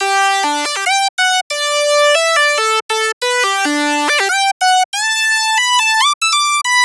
Melody G 140 Glider, Color Bass Essentials, Melodic Loops; Ghosthack - Color Bass Essentials